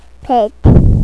plate

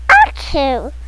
sneeze

